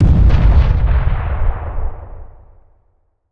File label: explode distant; arccw go; hegrenade